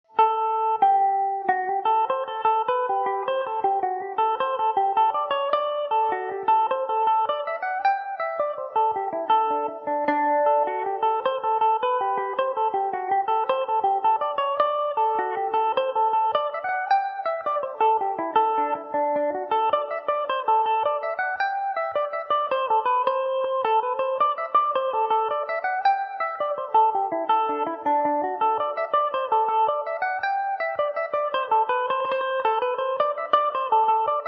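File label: D mixolydian, Irish mandolin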